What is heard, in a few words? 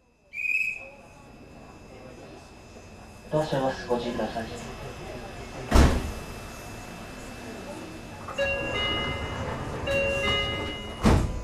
meitetsu 3300, train motor sound